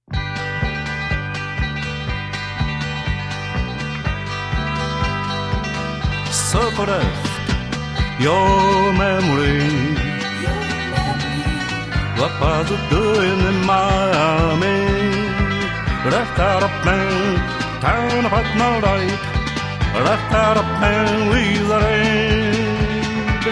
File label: your memory vocal